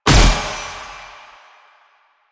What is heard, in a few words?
Kunai Proj Explosion; Warframe; Weapons; Choir